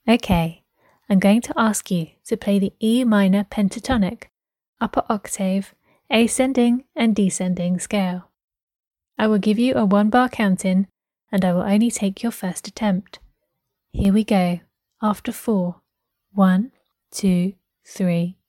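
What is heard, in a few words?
e minor pentatonic upper both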